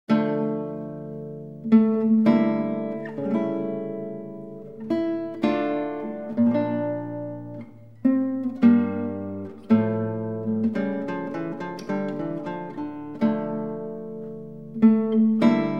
Partita d-moll Ciaccona; J.S. Bach; Partita Nr. 2; BWV 1004

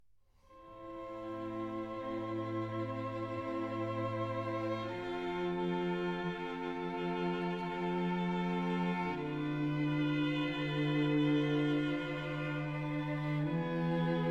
Quintet C major Andantino; Quintet No9

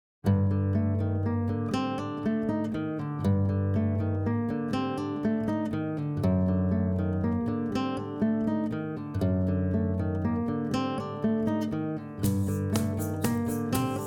Sonata Flamenca Solea por Bulerias

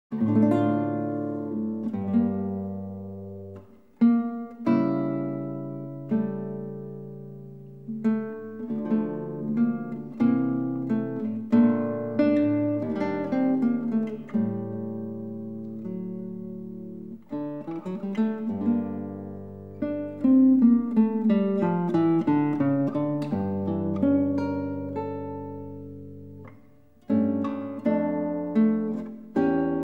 partita d-moll sarabanda; J.S. Bach; Partita Nr. 2; BWV 1004

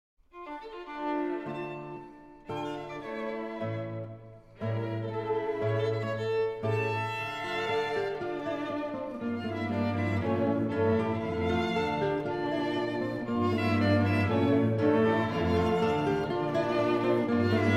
Quintet D minor Allegro moderato; Quintet No1; G445

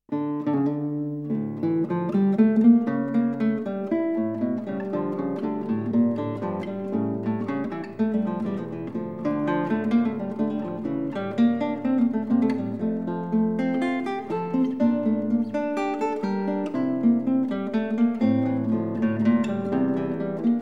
Partita d-moll Allemanda; J.S. Bach; Partita Nr. 2; BWV 1004

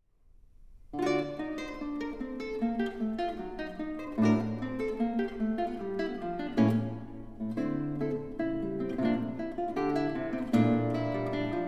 Variations on Frevo

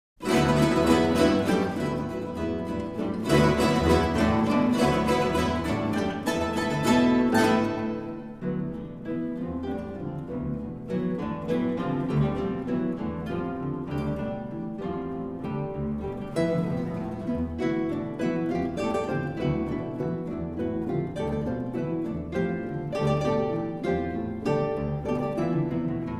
Guitar Orchestra, Preludes; 3 Preludes

